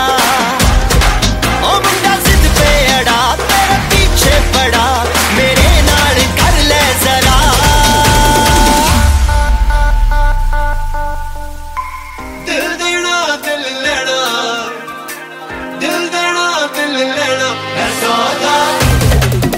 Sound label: ringtone, bollywood ringtones